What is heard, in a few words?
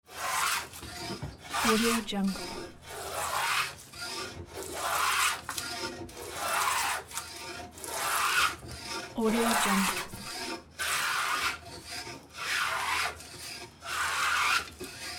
woodworking hand planer